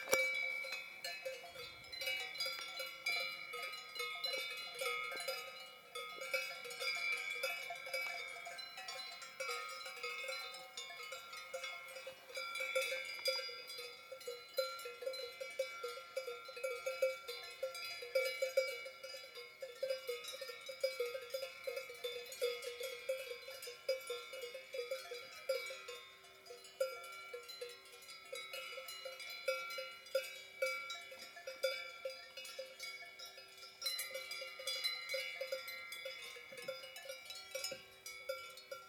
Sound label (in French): Vaches Cloches; Switzerland